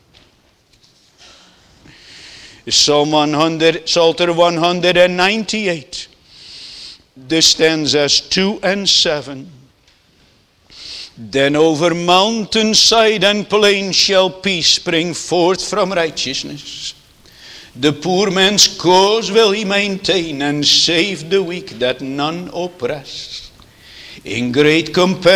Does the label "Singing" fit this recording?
Yes